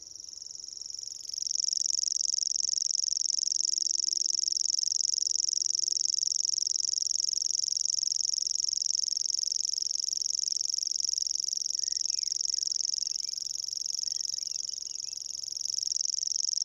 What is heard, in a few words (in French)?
locustelle tachetee; chant